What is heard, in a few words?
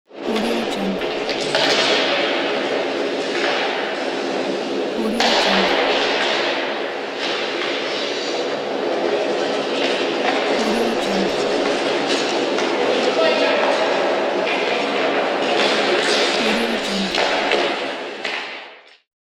hockey game ambience